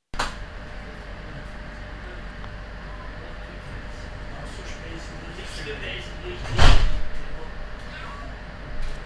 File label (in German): Strassenbahntueren schliessen